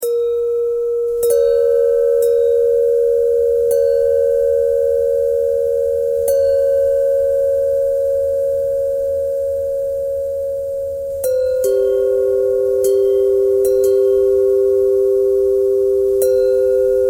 bell buzz

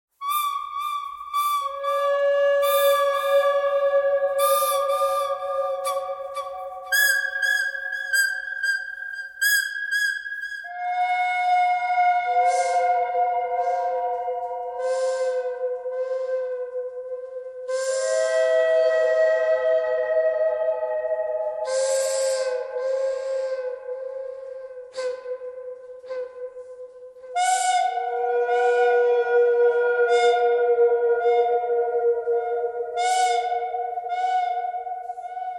bird canyon, birds

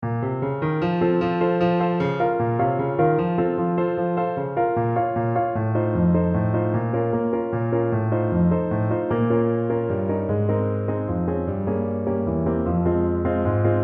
Accomp faster tempo; Bb min

